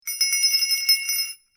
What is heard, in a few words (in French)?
Son Cloche globulaire; Percu